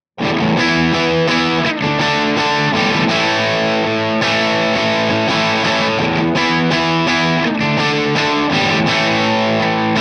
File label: crunch; jensentone